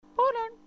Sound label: bolongg